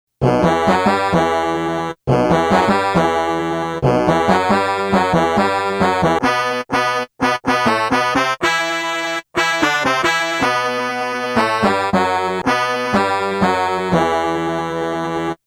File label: bella ciao melody